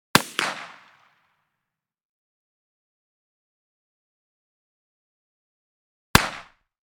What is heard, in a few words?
.357 Magnum Pistol Blasts Long Range; Hollywood Edge; Heavily Armed